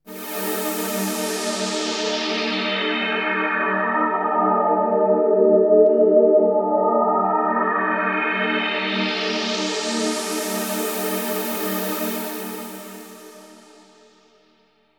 SYNTH PAD AMBIENT 160 C, Ambient; eJay; Sphere

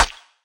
quad block; Geschicklichkeit; Bricks Of Egypt

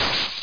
GLASS

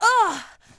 Hit

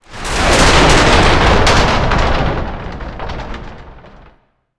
Rattle

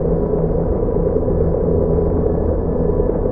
warp engine

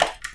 GearBox; SuperBike2001

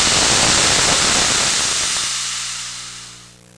deflating; Sound Effects for Multimedia - Volume 1

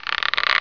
draw; HEXEN2; archer

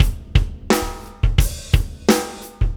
Straight Rock 86bpm, Drums; ACID MUSIC

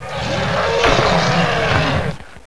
roar die; duke nukem total meltdown

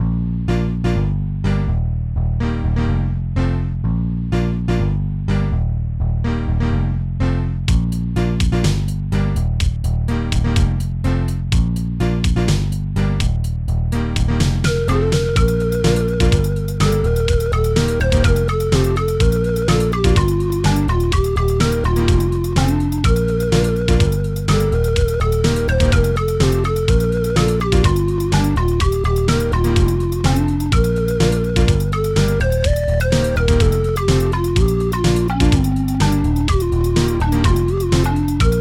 troll; Moog

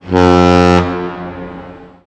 ship horn; pcrazy; Bovine; Dash

